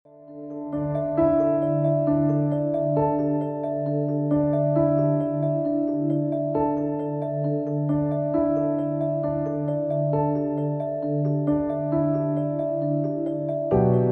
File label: Piano Relaxation